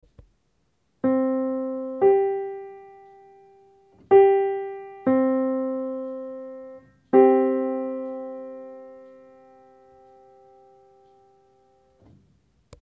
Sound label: 696.5 Meantone, piano tuning